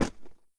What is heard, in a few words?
g bounce; weapons; valve